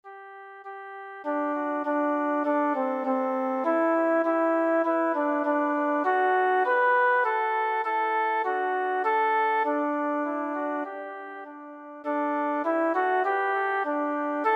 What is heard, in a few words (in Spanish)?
1 VOZ; Los 4 reinos